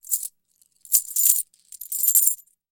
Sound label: Coins Jingle 02; Coin Movement Light And Jingle In Hand. - Jingling Coins